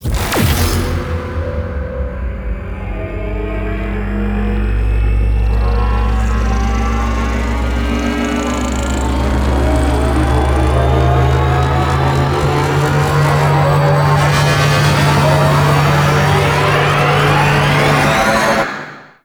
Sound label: Arachnoid Camper Death Charge Up; Warframe; Corpus